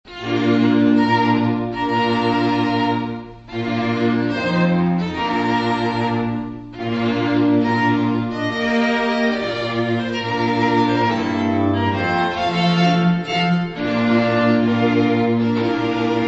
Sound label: bridal chorus, string ensemble; Wagner